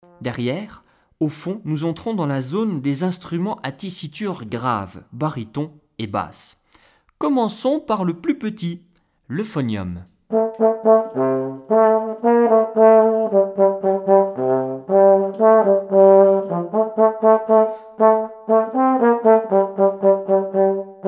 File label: Euphonium